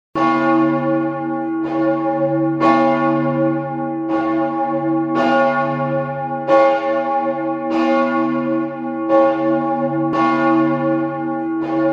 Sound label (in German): Klingelton Lumpenglocke; Gangolf